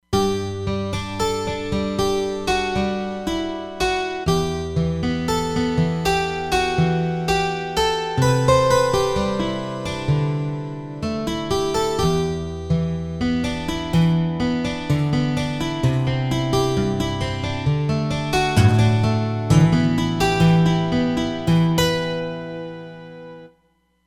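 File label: Steel Guitar Duo, Roland Fantom XR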